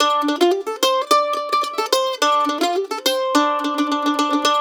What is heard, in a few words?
LICK, Blues Guitar